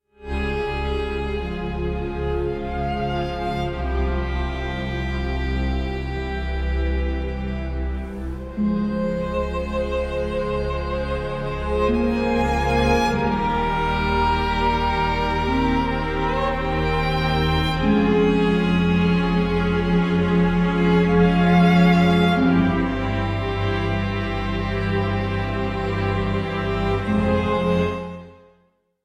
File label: concerto in f minor l'inverno for violin and orchestra; RV 297; op 8 4